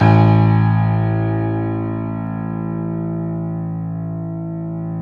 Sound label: Piano Grand; CMI